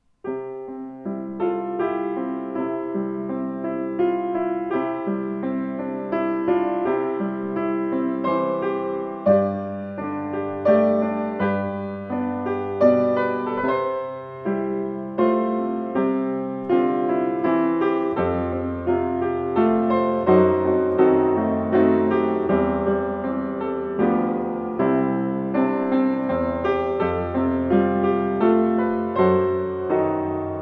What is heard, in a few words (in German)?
Steinway Z Stille Nacht; 115 steinway z nussb